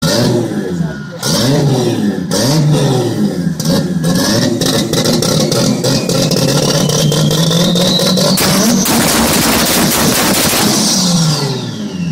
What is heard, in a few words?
supra brutal antilag sound compilation